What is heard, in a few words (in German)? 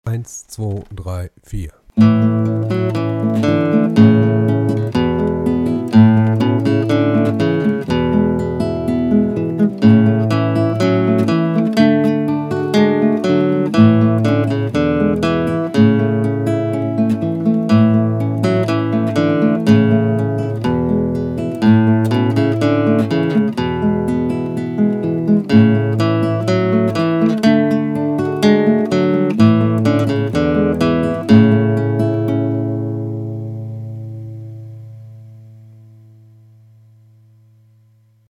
gitarre